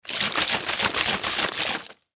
Drink Bottle Shake, Drink Bottle Shake Liquid Slosh; Splashes